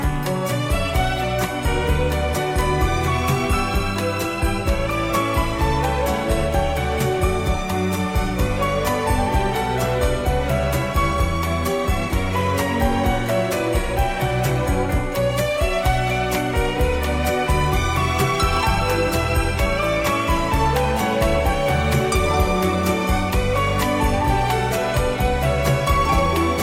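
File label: toccata melody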